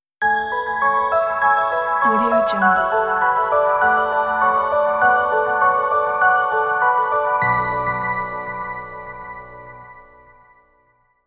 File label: dream piano logo